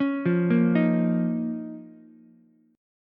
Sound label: D sharp 7th Suspended 2nd D sharp, Ukulele; arpeggiated; 6,5,6,6; D#7sus2; JGuitar